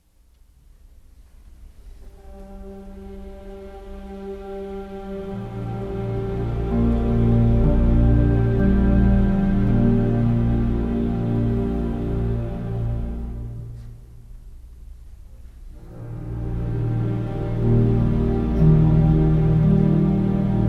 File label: strings, Sad